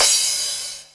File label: drum hitwhistle